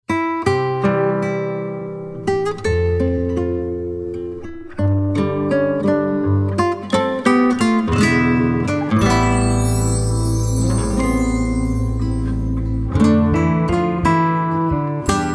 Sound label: instrumental